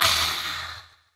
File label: "ah"